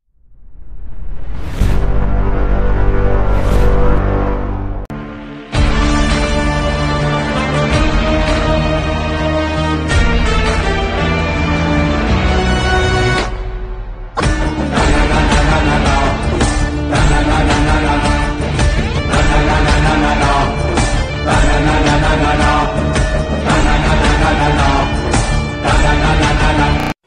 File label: Music Ringtone